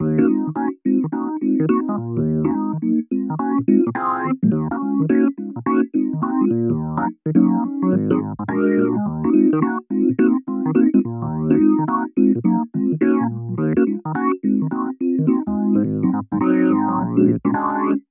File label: epno adv stereo